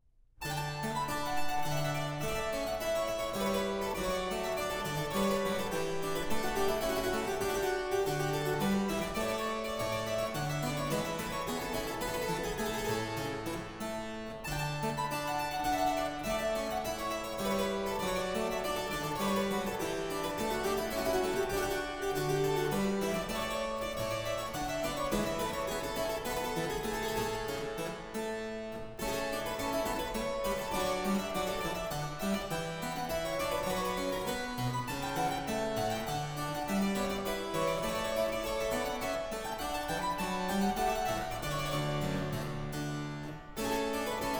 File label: Hornpipe, Suite In D Major; Z. 667; Purcell